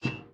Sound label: metal small inventory pickup; factorio 2.0.55